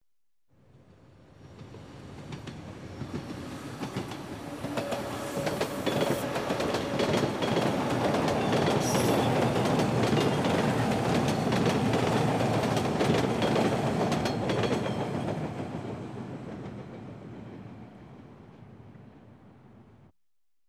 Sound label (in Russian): metro poezd proezzhaet mimo stuk koles